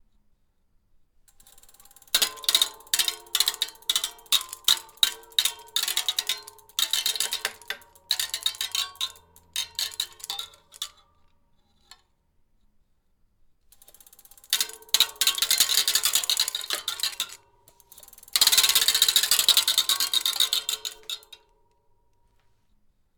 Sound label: Belt Buckle On A Spinning Bicycle Wheel